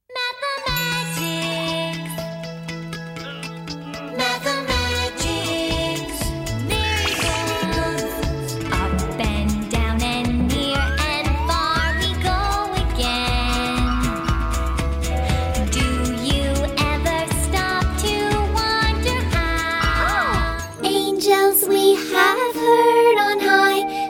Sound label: women singing; Energetic, young, warm, quirky, French, fast talker.